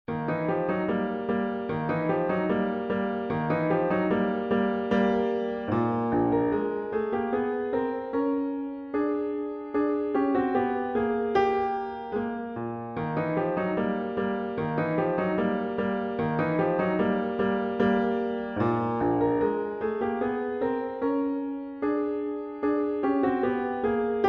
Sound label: piano miniature